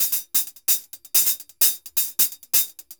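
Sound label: hi-hat, MERENGUE; Best Service ProSamples vol.14; AKAI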